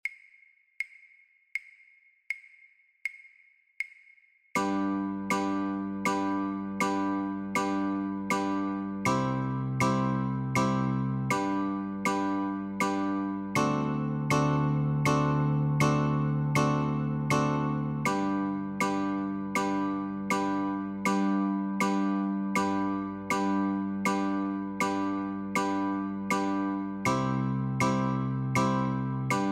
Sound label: Chords, guitar; christmas